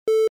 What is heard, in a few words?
beep